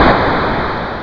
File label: boom; Critical Mass